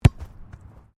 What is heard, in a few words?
SOCCER BALL KICK GROUND; Soccer ball sounds; Sports